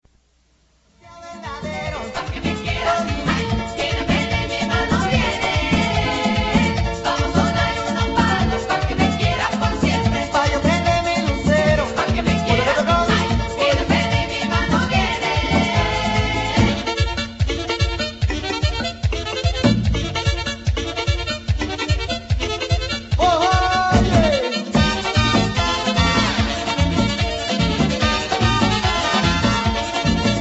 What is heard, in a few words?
merengue